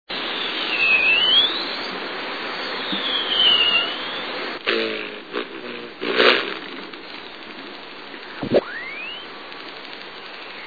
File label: Costas Hummingbird, songs